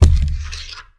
footstep; Barotrauma; Barotraumatic; Sentinel

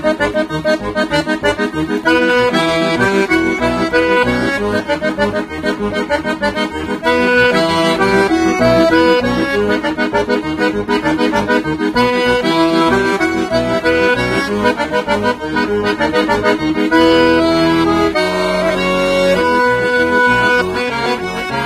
accordion; Barotrauma; Epic accordion

